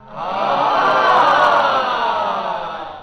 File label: crowd ahh